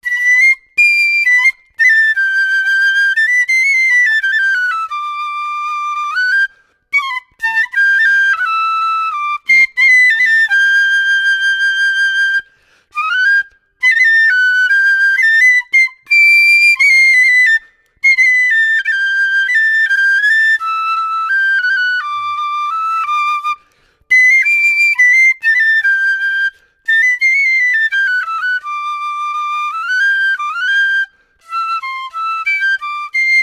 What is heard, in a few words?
Wind Instrument; Assassin's Creed Syndicate